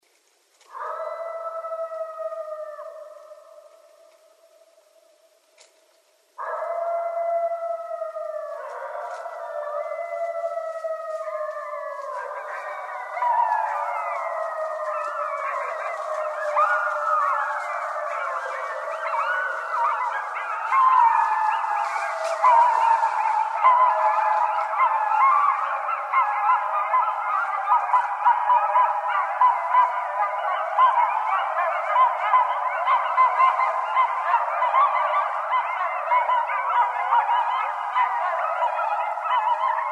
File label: Coyotes; Coyotes (Canis latrans)